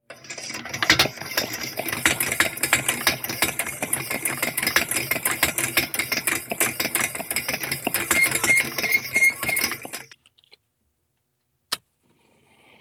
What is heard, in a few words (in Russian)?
Fonar gazovyi